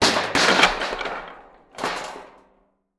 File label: Crashes Metal Sheet And Wood Stakes Hitting Wood